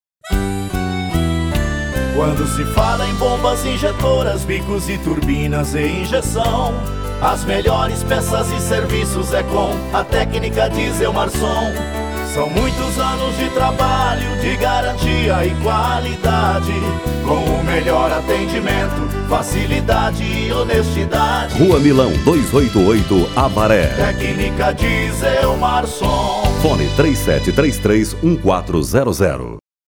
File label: Jingle; Técnica Diesel Marson; Bombas Injetoras, Turbinas e Diesel